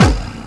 Tec Bdrum; Urban Shakedown

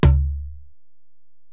Ac Perc, Drum Machines Alesis DM-PRO

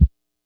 kit, Yamaha Motif-ES8

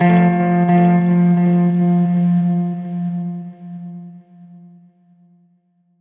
Strat Guitar Loop

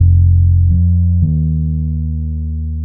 Bass, Futurist Drum & Bass

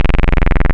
PWM, wavetables; Animoog

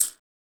RSS PERC

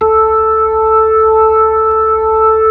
B-3 Club Xfade, Keyboards of The 60's and 70's

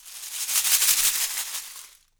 shaker fx; Univers Sons - Raricussions

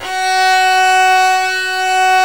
VL TEN F#3-L, STRNGS

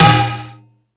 Multi FX, Drum Machines, E-MU Pro-Cussion

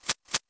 Crash Box, EMU Orbit-3 drum machine; 300 Drum Machines

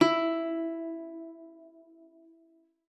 E4, guitar; Xsample VOL-2

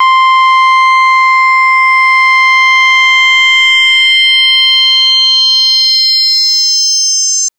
Synth TriangleSweep c5; TrashLibrary

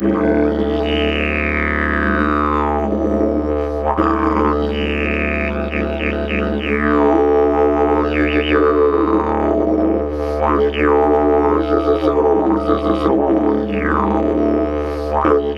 D LONG DRONE, Didgeridoo; Big Fish Audio - Didgeridoo and Other Primitive Instruments

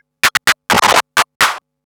Scratch Fills 140BPM

